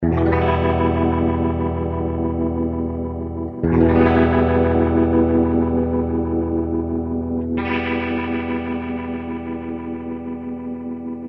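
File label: clean electric guitar, rotosphere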